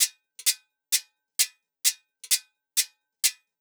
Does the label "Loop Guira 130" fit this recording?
Yes